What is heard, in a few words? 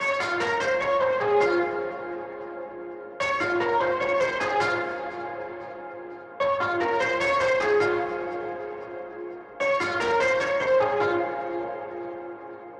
keys patch